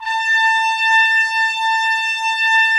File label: Trumpets; Roland - LA Composer vol. 4